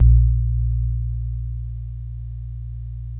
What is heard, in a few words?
BASS 2; Hip Hop; E-MU Formula 4000 Series Vol. 1; Hip Hop Nation; Big Bottoms